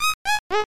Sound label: Error Jingle; Ultima Runes of Virtue II; SNES